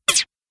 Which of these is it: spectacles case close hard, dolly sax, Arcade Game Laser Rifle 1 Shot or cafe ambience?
Arcade Game Laser Rifle 1 Shot